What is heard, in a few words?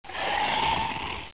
hiss